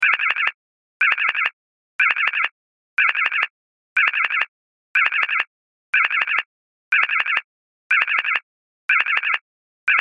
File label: Sci Fi Computer Screen Readout Digital Display Loop; Blastwave